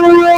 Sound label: SYNTH VO